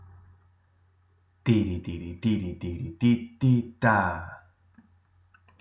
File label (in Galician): RITMO